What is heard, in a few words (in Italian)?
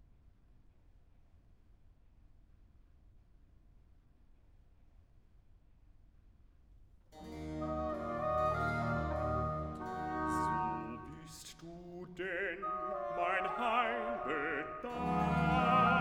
Recitativo (Bass); BWV 30 'Freue dich, erlöste Schar'; Bach; London; Feast of St John